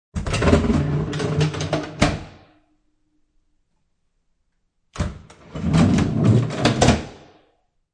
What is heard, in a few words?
Drawer on rollers opening and shutting.; Rolling Drawer Open and Shut; Drawers (Sound Effects:Household)